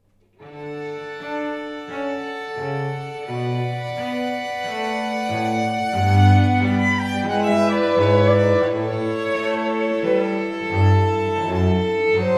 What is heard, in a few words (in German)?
Bach Air Orchestersuite D-Dur